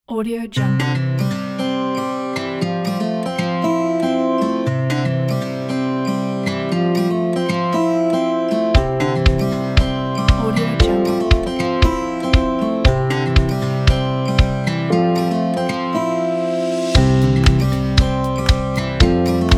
acoustic folk inspiration

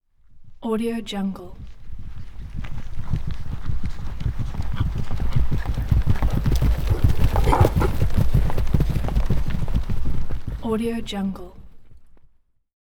two horses pass by on hard mud and grass

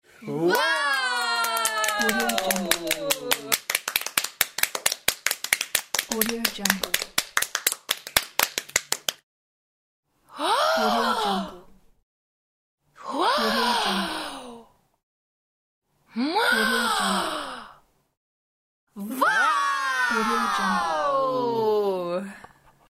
surprised emotion

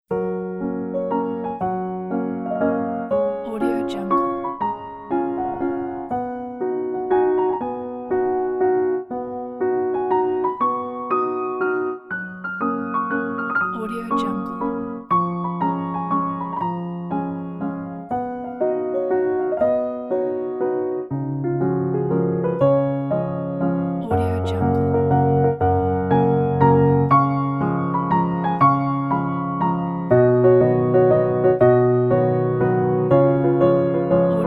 happy piano waltz

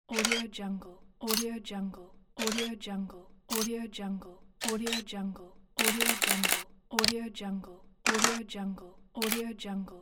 camera shutter

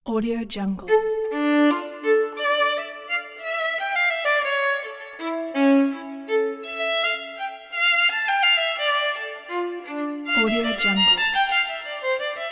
bach giga

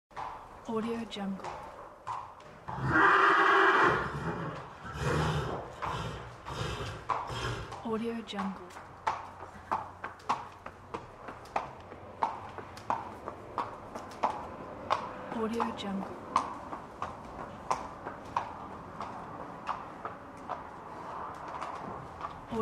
horse stable

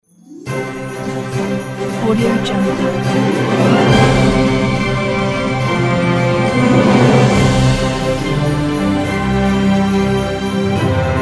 christmas wonders

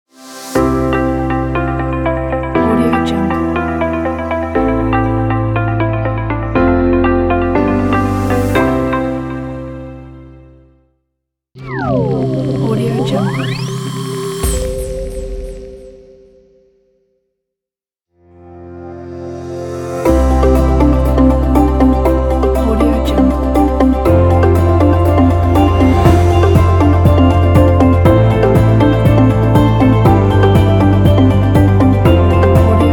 corporate technology logo pack

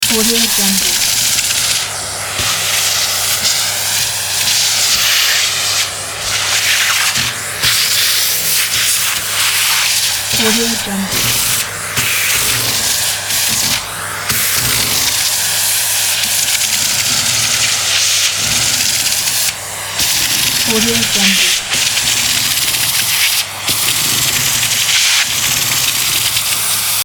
vacuuming sound loud

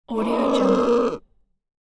monster pain moan

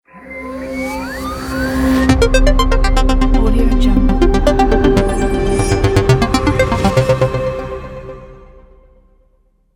tech logo

